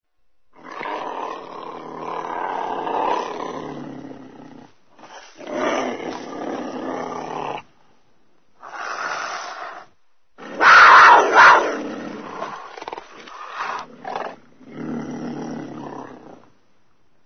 lleo

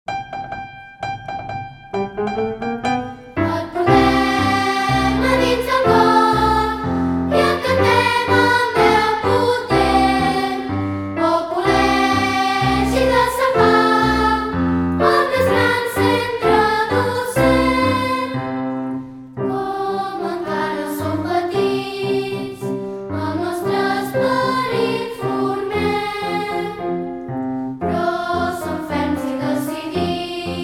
himne, L'himne de l'escola; ceip sant pau

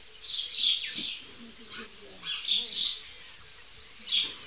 pardal

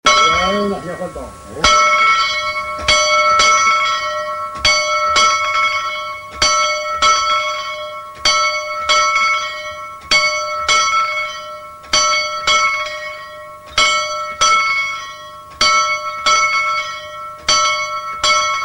casament i bateig, campanars tocs

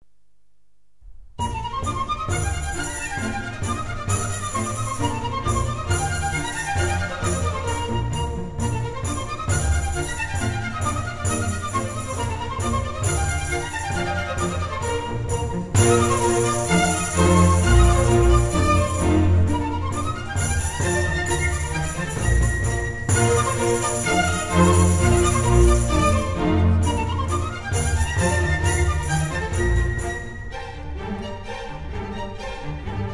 tambor

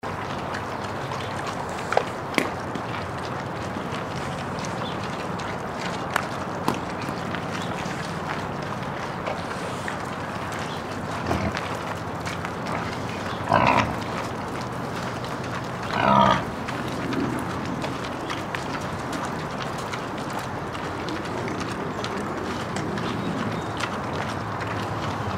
senglar menjant

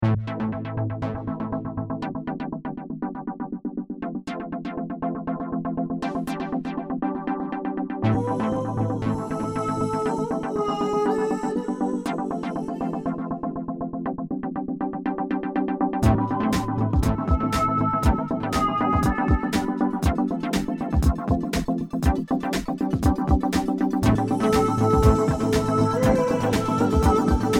musica mix

